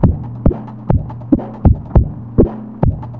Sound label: FILTER, Big Beat Drumloops